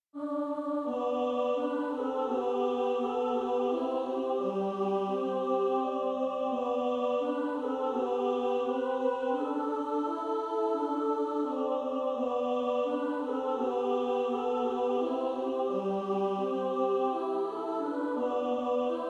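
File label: Female Choir, Practise Music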